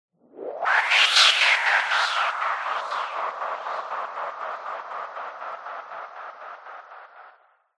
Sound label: sound design sweep white noise filter flutter